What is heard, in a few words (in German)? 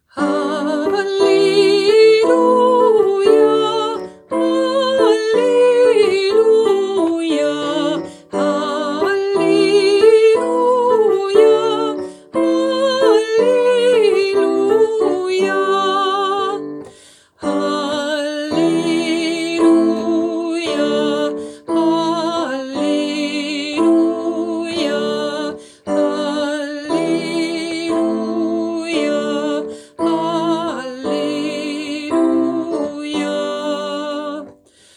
Halleluja alle Stimmen; Rundfunk 2020